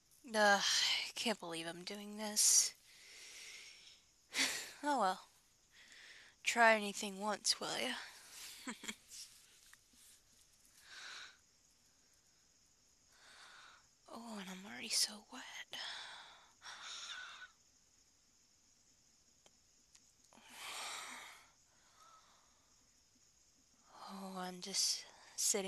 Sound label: Outdoor, Lady sounds, Giggles, LOUD, Damn birds; F4A; Playing in The Backyard; Exhibition